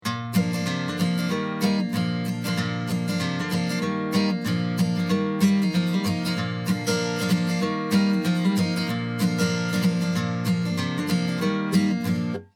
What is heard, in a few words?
Double From Mono fake Stereo; create a doubled stereo track from a mono source